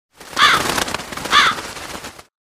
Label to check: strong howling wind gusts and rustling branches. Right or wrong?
Wrong